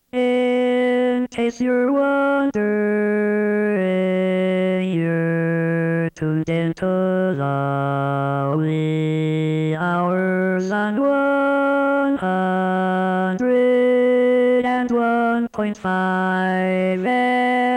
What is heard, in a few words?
jingles; Station Call #4